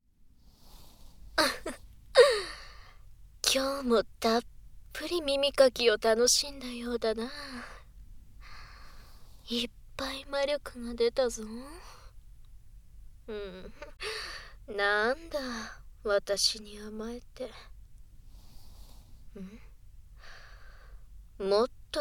耳舐め 左からスタート; 耳舐めで根こそぎ癒しをしゃぶりつくしてやる; 魔法音なし~ノイズ除去なし; ライバル魔法少女の耳かき

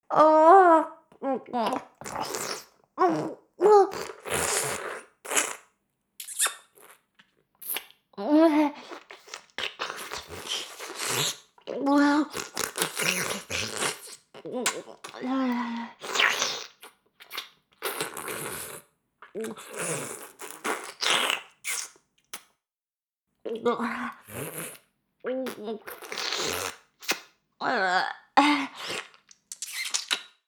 フェラ音声; 牧場戦腺; 少尉

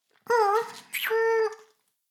フェラ音声; 古き城のドラゴニート2

